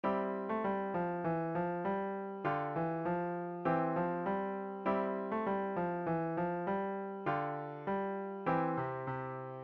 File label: Crossing 2 over London Bridge, piano